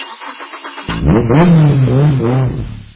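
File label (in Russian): звук завода двигателя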